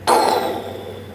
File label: switch; abyss